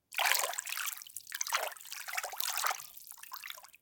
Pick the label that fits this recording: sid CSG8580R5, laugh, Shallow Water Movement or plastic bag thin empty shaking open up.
Shallow Water Movement